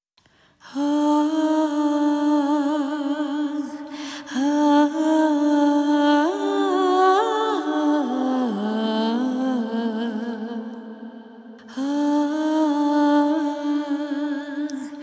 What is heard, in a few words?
Vocals